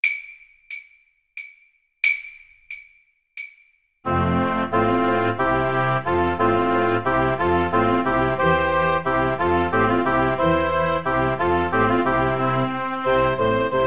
handel water music kar, Flute

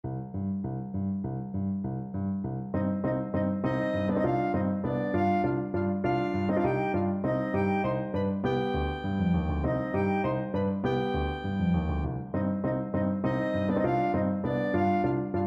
danses des cygnets, clarinet

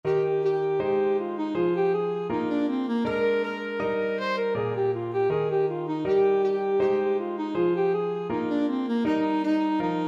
bach gavotte cello suite, saxophone

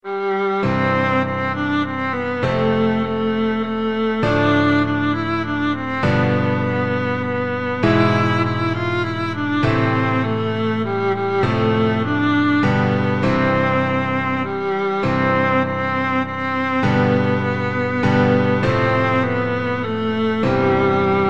we wish you a merry christmas, viola

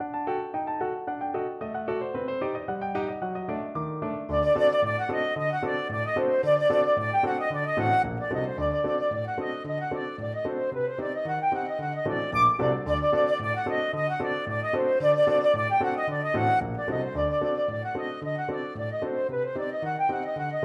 flute

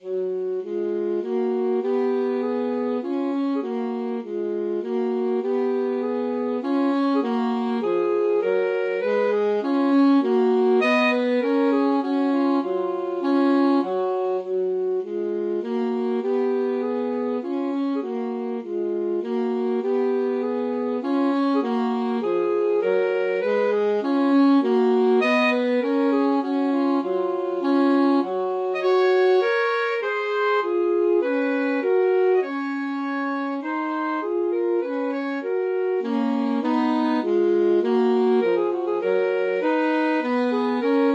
Menuett in alto saxophone duet

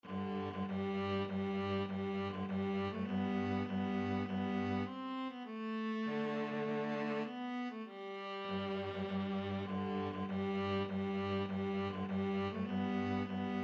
send the light, viola cello duet

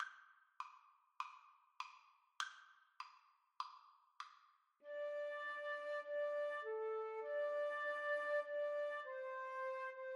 flute duet

